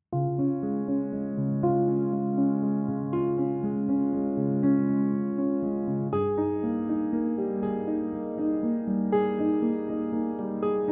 piano textrue only

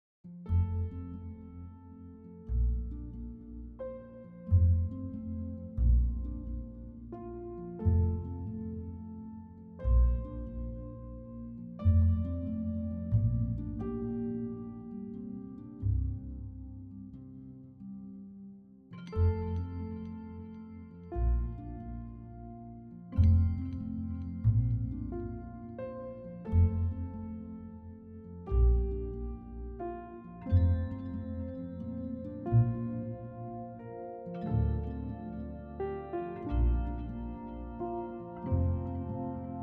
Waltz Simple Loving Bitter Sweet

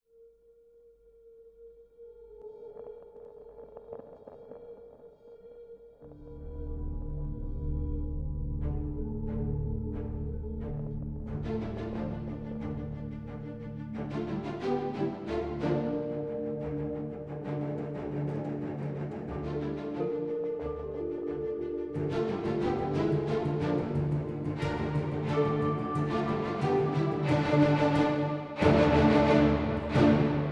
Epic Cinematic Orch Hybrid Dark Energetic

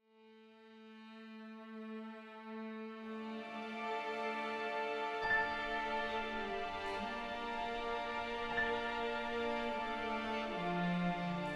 Strings Emotion

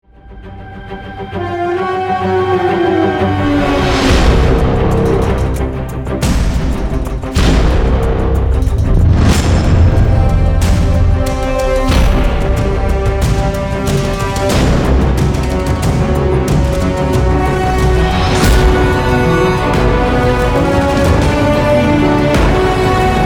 action epic style heroic

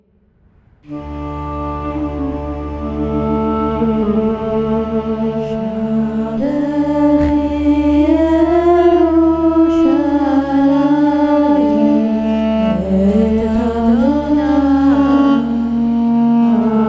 Prayer Style; Shabechi Yerushalaim

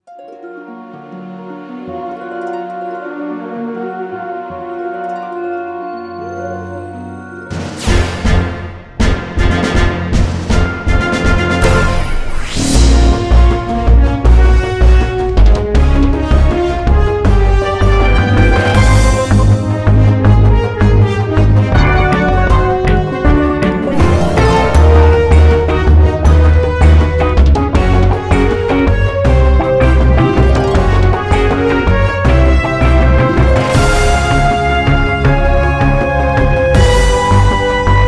Live horns

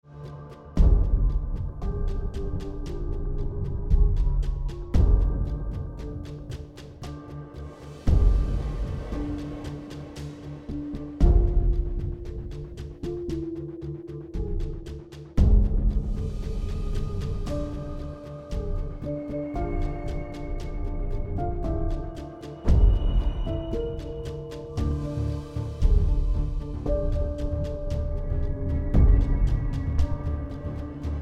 Turnaround Texture

